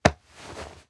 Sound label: Impact Human Grab And Pulled By Clothes